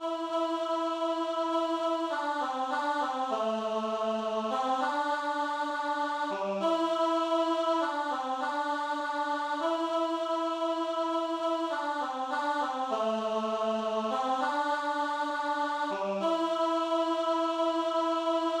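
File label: Tune Piano; Extinction Rebellion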